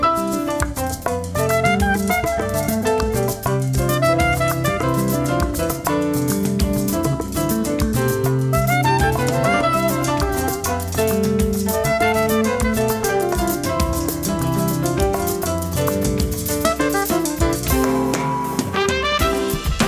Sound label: Salsa Soca